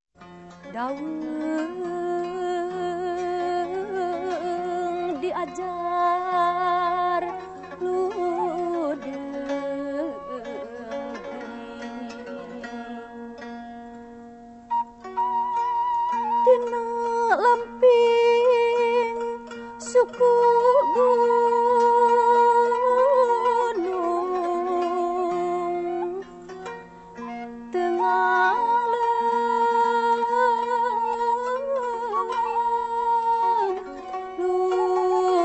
Music Sunda; World Sounds

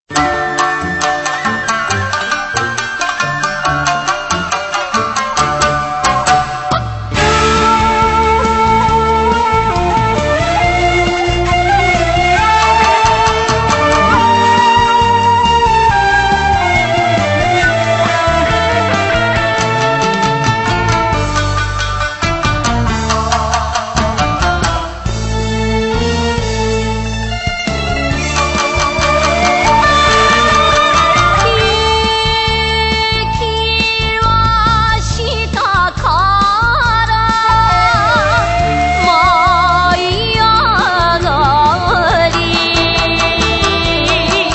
Enka Shamisen

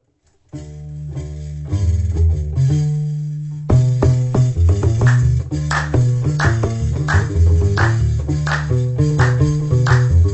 Arabic Cafe

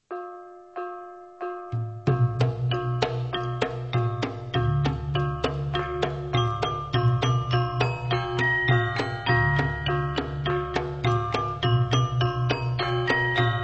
Gamelan Dance Music; Universiti Malaya

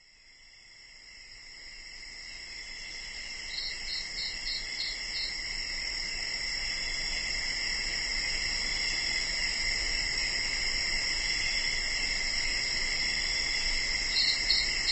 108 Gongs

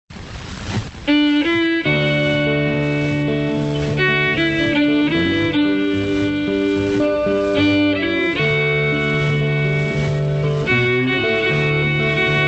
Strings

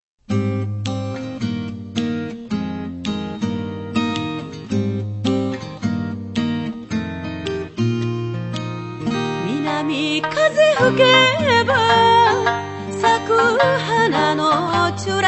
Okinawa Best Song

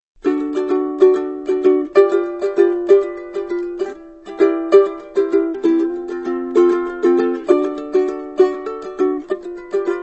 Ukulele Hawaiian

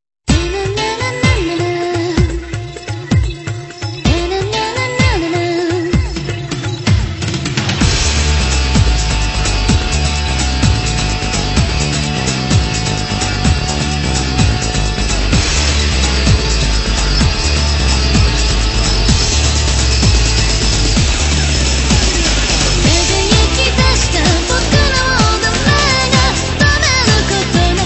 Japanese Pops; 90s

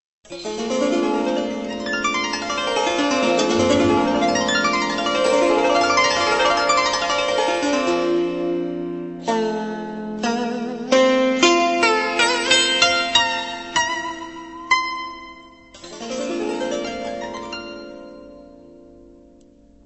Traditional Instrumental

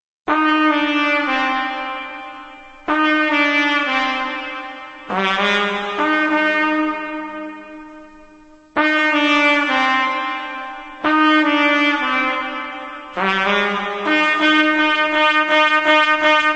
Dub

